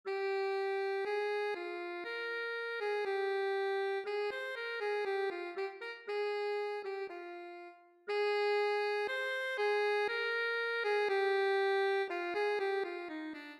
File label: alto sax etude